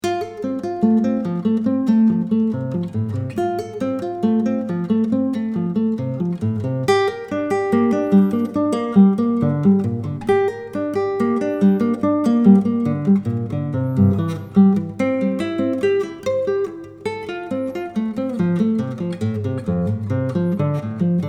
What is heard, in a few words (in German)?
triad study, Dreiklangs-Etüde, guitar; Akustische Gitarre/Klassische Gitarre: Solo; „Triad Study #1“